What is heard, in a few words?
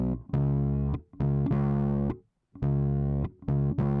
bass; musdb18